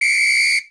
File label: referee; puppet hockey